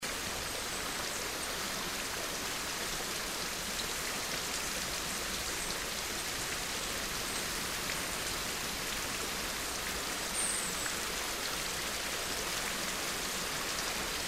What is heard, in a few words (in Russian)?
dozhd